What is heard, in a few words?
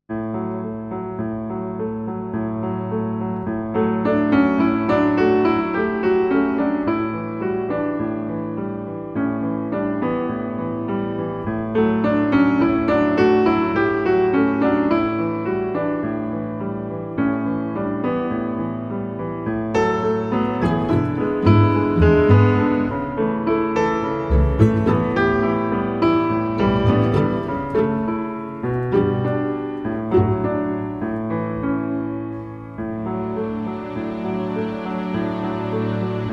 Seascape Piano and Strings Pizzicato Version